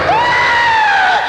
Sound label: scream